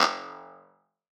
Glitch, One Shots, Glitch FX; Touch Loops; CosmicFunk

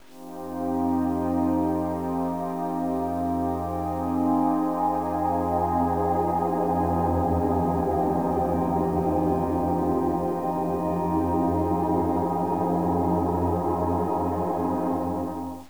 AMBIENT FADING BEAUTY; KillerTweaksII; DirectWave

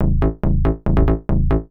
TECHNO BASS 140 A

